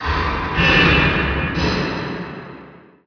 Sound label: warehouse clang; SpecOps2 MPlayer Demo